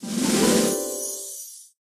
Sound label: takeoff; aviamaster; Aviamasters